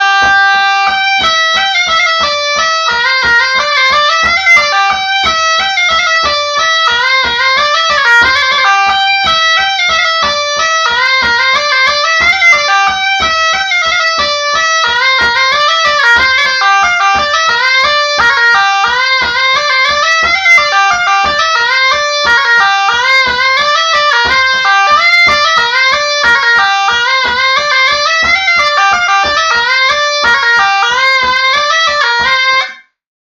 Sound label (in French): La Bourrée à Pagès; Bourrees